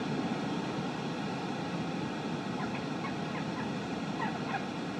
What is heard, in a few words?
Ambience Loop, outdoor